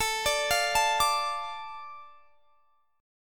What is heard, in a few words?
A Suspended 4th Sharp 5th; Asus4#5; arpeggiated; x,24,24,22,22,22